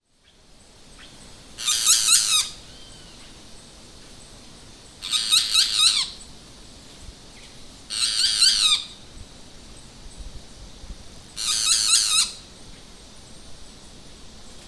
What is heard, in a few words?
Megarynchus pitangua Neinei